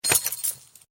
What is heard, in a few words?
Lock; DailyChallengesPopup